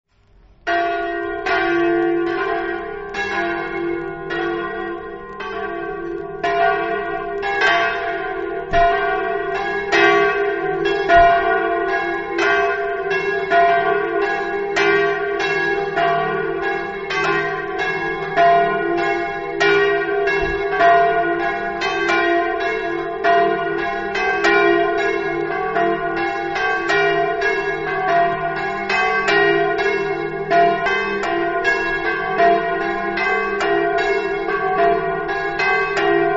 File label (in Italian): braulins, campane